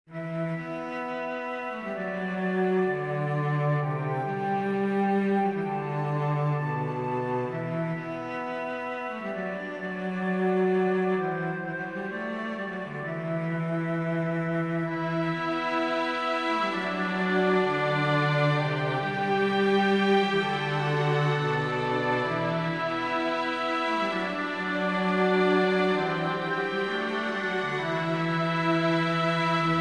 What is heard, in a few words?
puzzle Win; keepsake